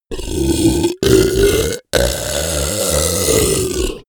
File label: beast grunt weak; kreaturen; comedy-cartoon; soundpool